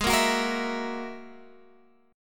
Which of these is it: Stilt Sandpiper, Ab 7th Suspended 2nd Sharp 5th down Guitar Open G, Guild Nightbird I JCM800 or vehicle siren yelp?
Ab 7th Suspended 2nd Sharp 5th down Guitar Open G